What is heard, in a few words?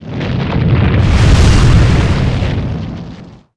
Rock Pyramid Rumbe Launch; Destruction